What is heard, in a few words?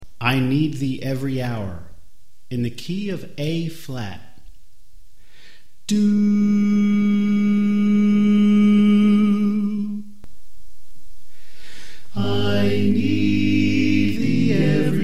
Soprano Left; I Need Thee Every Hour